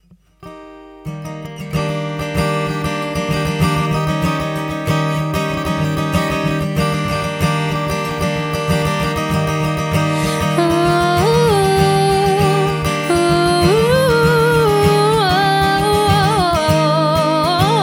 Acoustic

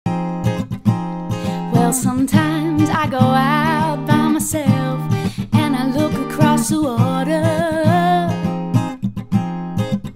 Acoustic

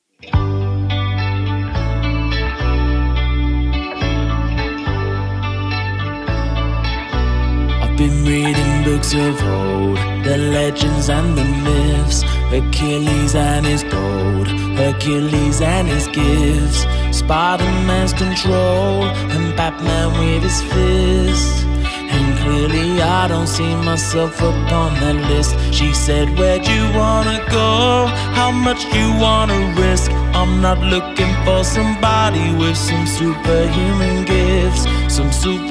Electronic Duo

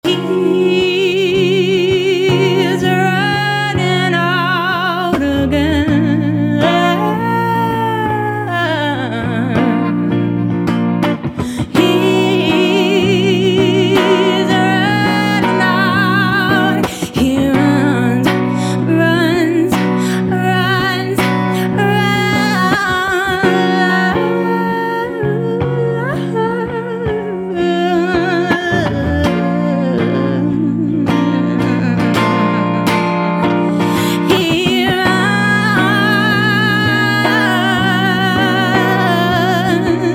Solo

